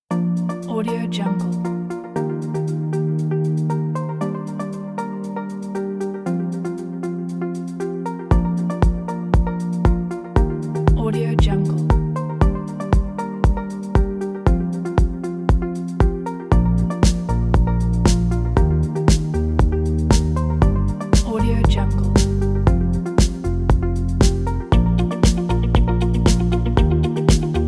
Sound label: motivational and inspiring